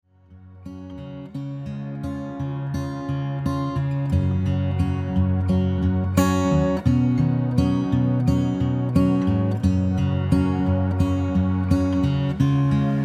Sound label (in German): komplette band